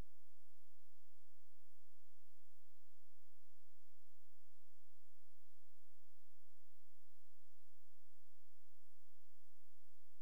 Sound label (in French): bruit blanc